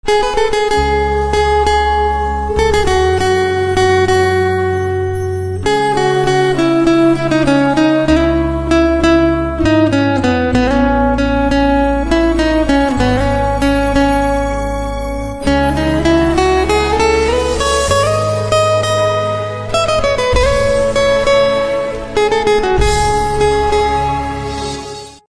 Guitar Instrumental Ringtone

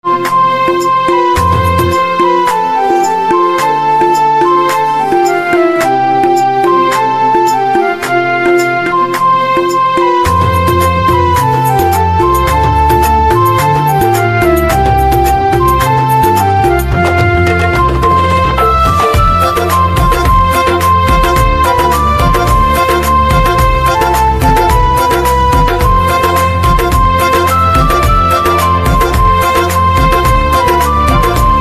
Organ

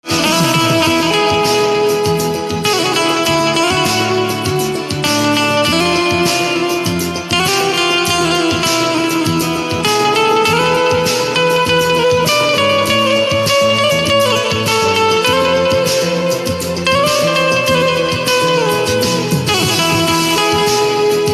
Banjo, instrumental ringtones

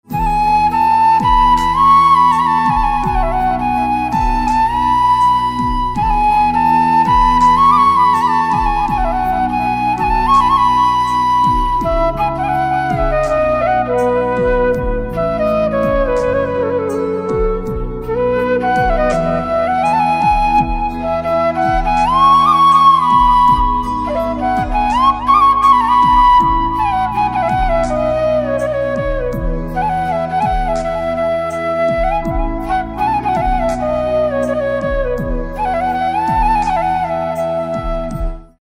Flute

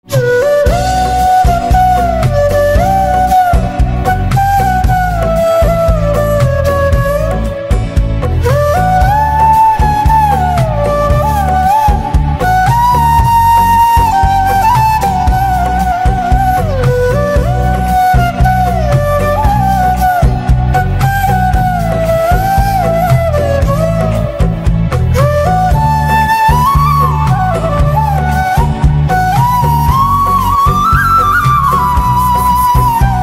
Flute

